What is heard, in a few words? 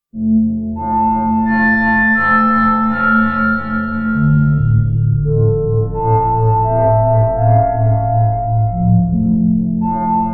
Space Twists